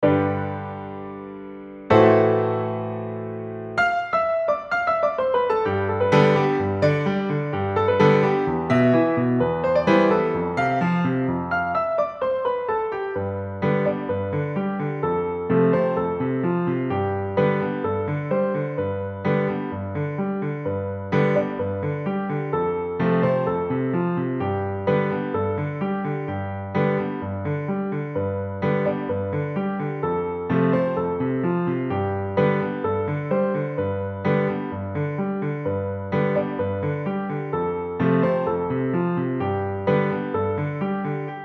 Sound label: adv, piano; Kati Patang